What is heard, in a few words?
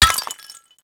tile hit; projectiles; bullethit; Max Payne